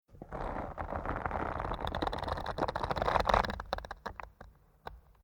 Shipping Container Rubber Door Seal Closed Tight Squeezing Rubber; Creaking